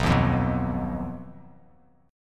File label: B Major Flat 5th B Bass Standard; BMb5; strummed; 7,6,3,4